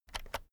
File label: keyboard external shortcut short